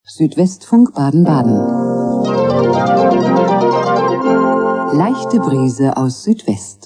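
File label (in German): leichte brise, Radiojingles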